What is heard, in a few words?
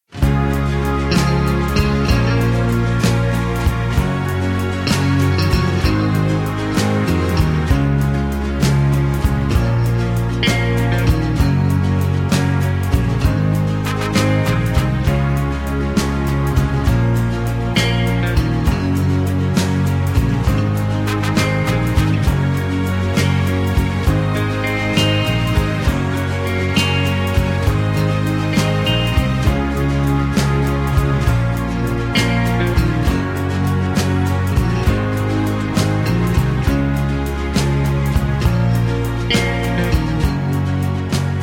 (Db) No Backing Vocals